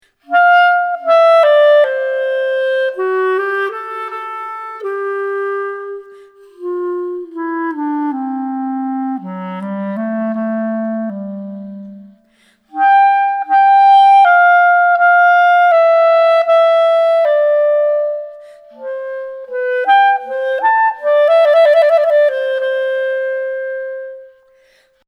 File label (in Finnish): klarinettia